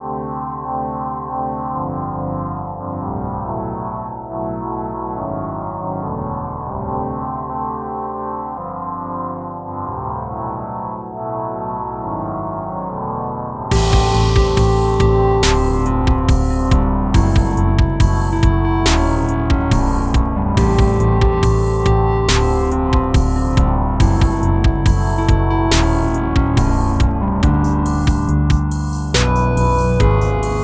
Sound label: Moody Lofi Interlude